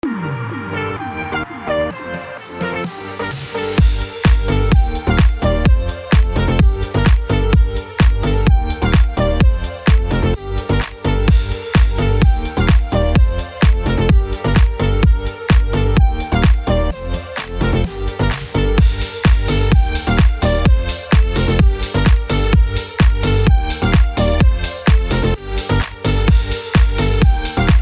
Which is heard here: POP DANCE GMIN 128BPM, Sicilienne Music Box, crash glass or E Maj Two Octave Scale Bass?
POP DANCE GMIN 128BPM